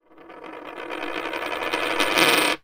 coin spin and stop

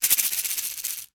household bath sprinkles shake fast; zapsplat

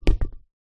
sport beach soccer ball down on ground

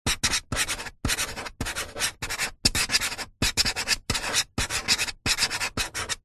office pen writing on metal

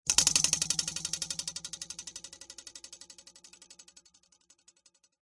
cartoon spring twang bounce metal ruler twang

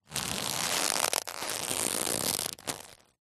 tissues small packet facial handling rough creak; foley; zapsplat

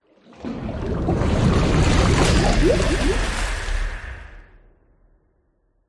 water whoosh impacts movements; george gazetis

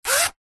foley zipper clothing or bag single fast movement; zapsplat

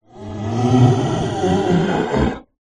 horror evil demonic growl groan

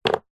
mouthguard gumshield set down table; sport; zapsplat

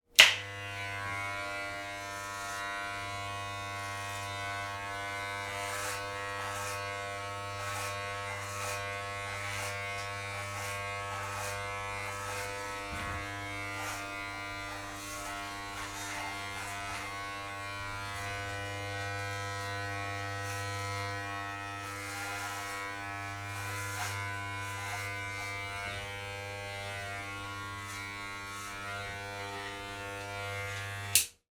hair clipper switch on shave hair then switch off

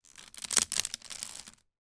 household laundry basket wicker lid sit on; sound effects one

